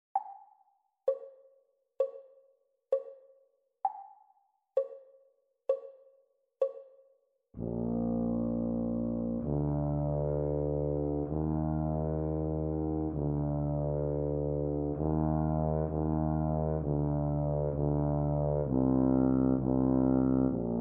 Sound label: Bb Tuba Duet practice speed; Grade 1